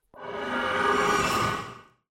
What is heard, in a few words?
summoning